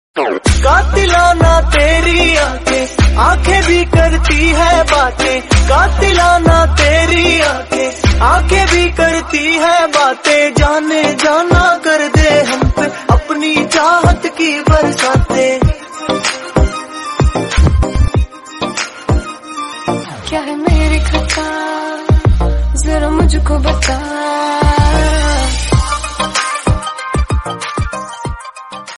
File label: bollywood remix song tone ringtone